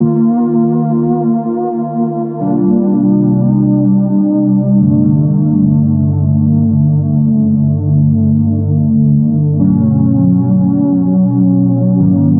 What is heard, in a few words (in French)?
nappe